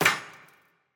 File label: Shrapnel Hit